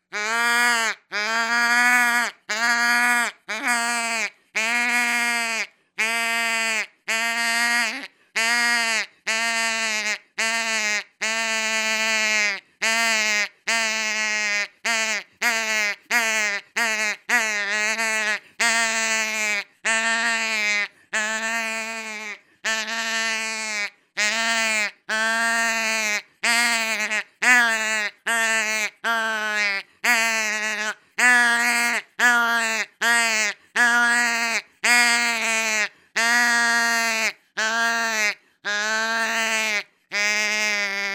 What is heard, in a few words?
Fawn Distress, Deer; Dave Kelso